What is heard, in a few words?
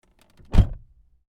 car door close internal; spoteffects